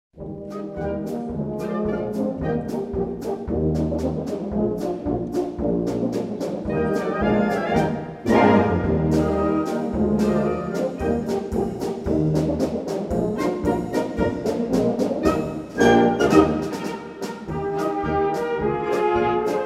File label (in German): swingpolka